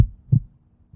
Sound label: heartbeat; RS Games Sounds; Monopoly